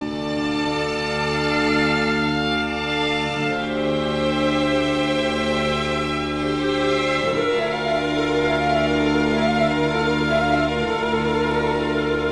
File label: strings, Sad